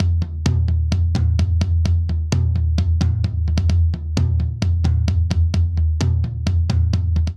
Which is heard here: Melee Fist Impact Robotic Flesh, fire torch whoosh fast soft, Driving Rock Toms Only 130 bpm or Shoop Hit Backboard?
Driving Rock Toms Only 130 bpm